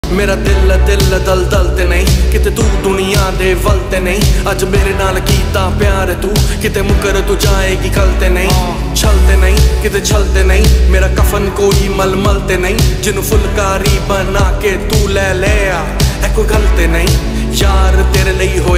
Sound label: punjabi ringtone